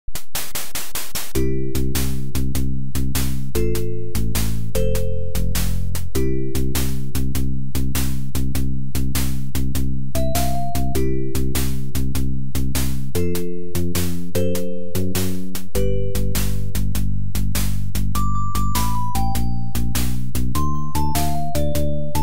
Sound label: stage music; Golden Fire II; arcade